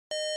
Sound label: Square Wave Beep. Short Square Wave Beep With FM Modulation.